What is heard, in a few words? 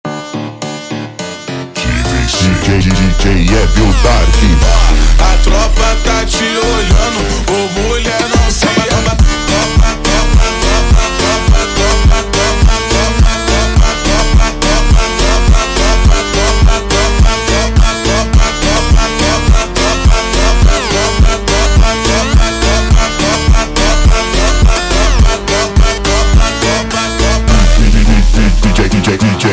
Funk Zuado super Slowed